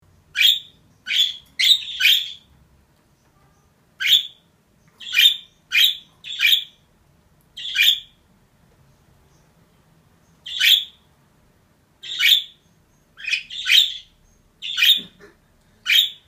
muhabbet kusu sesi

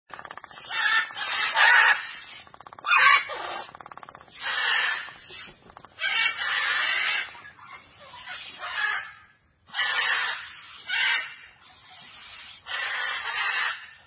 tukan papağan sesi

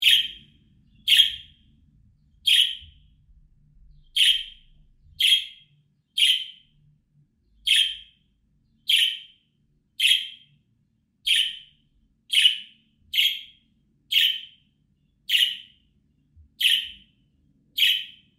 muhabbet kuşu ötmesi

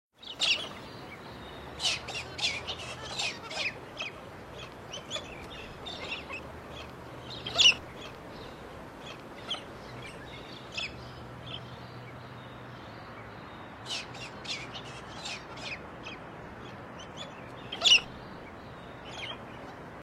galah kakadu papagani sesi

